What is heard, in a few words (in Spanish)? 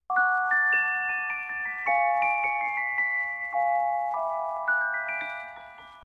Celesta sonido